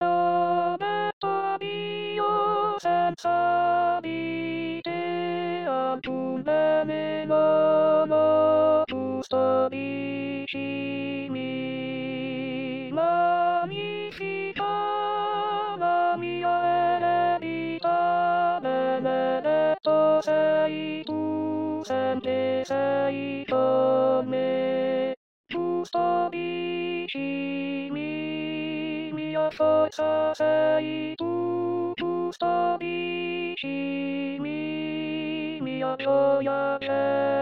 Custodiscimi Contralti

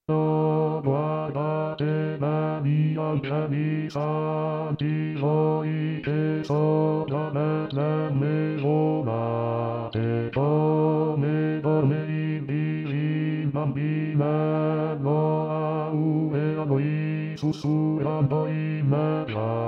Bassi; Oh Guardate Begli Angeli Santi; natale

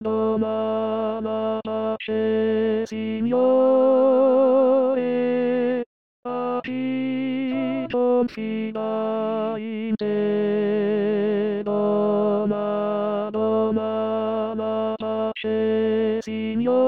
Tenori

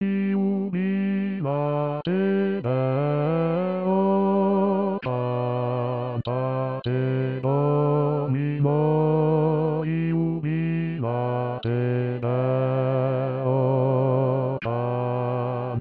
Bassi; Jubilate Deo

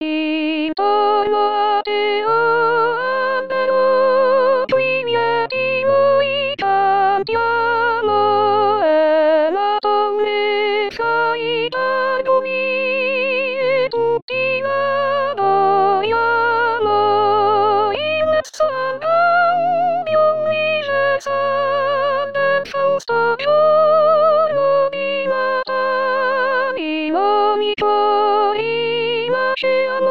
O Tannenbaum Soprani; natale

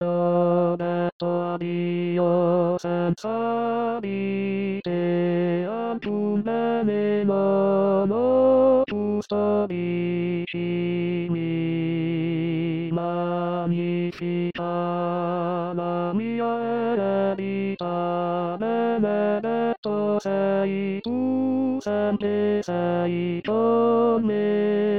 Custodiscimi Tenori

